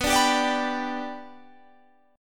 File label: B Minor Sharp 5th, Guitar, Standard; strummed; JGuitar